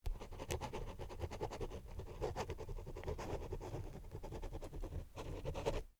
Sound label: Taking notes with an ink pen